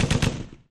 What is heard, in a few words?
Heavy Machine Gun, bursts